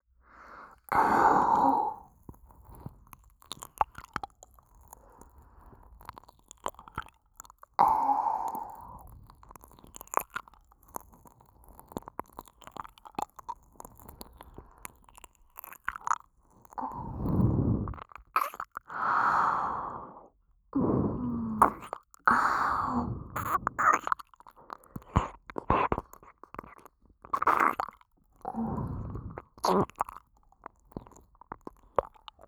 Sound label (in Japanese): 右耳舐め, ASMR